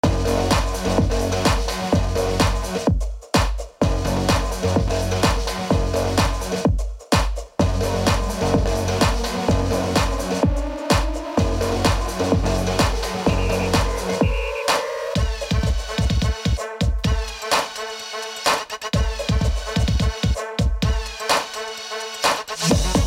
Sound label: Radio Car Electro